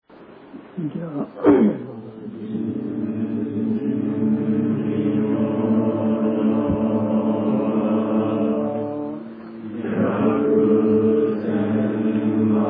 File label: chant